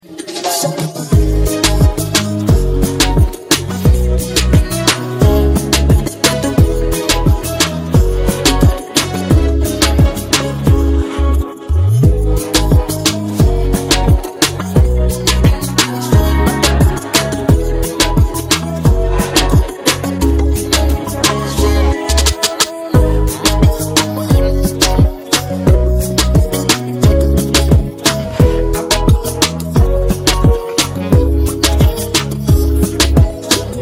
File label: instrumental